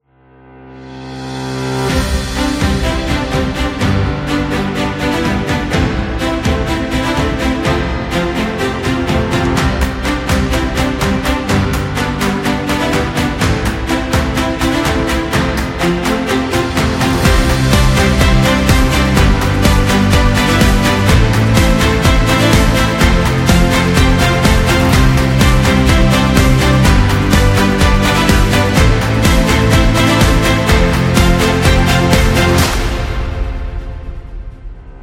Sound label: Motivated; corporate intro